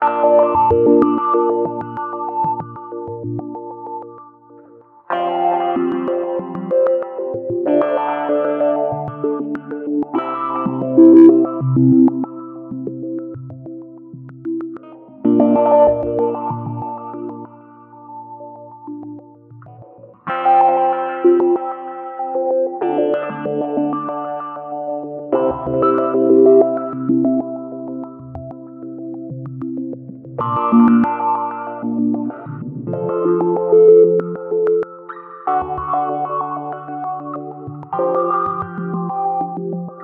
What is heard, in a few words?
Filter; Line 6 HX Effects